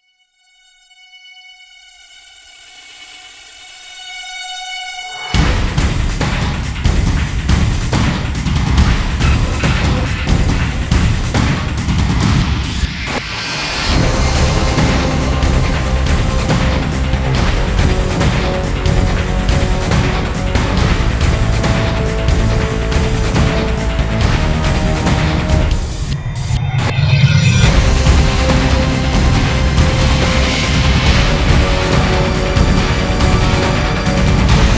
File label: Thrash